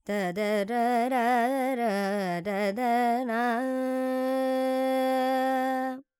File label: Lydian, Vocals, Carnatic Female, Alaps, Dry; 70 G; Sample Banks